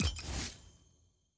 big click; profiles; summoner

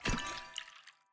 milk drop

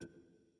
ui archive generic hover locked

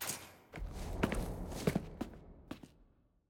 trans collection archive return click

